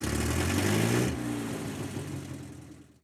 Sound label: engines; World of Tanks